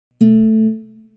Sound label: tone a, guitar